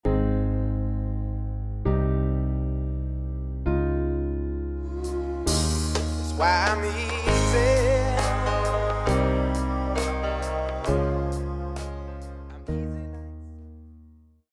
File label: I-iii-IV-V Easy